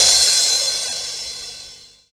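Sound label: crash reverse, Dance Mega Drums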